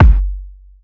Kik; Rawcutz - Nu Forms

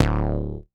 Meanie Bass, Old Skool House; Big Fish Audio; OSH Bass

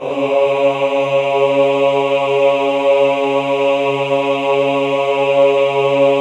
AHH C2, choir; Best Service; Extended Classical Choir; AHH MIX

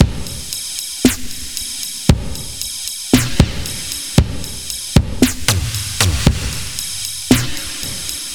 Beat Glossy; Zero-G; Future Wave; Beats

